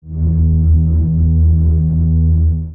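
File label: Laser Fire Exterior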